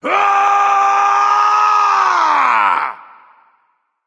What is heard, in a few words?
battlecry; smite; poseidon